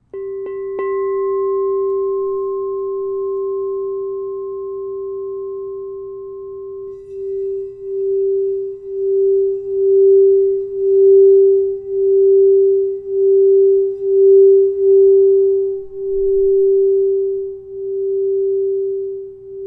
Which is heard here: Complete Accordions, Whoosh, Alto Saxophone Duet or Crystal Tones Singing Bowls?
Crystal Tones Singing Bowls